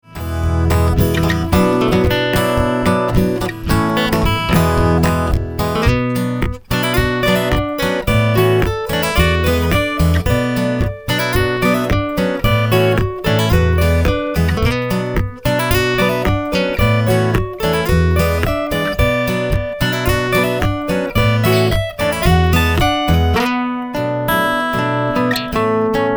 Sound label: Acoustic guitars